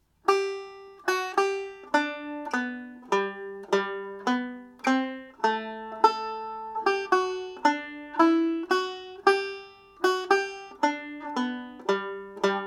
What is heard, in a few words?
CAPTAIN BYNG slow, banjo